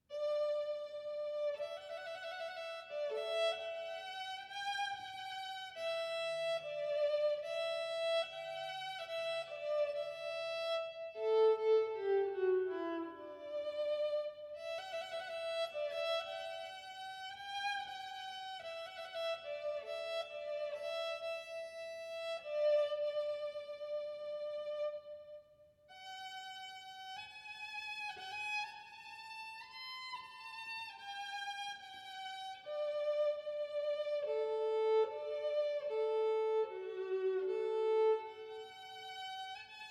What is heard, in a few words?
Trumpet Voluntary, violin